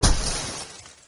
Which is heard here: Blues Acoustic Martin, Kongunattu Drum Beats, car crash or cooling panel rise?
car crash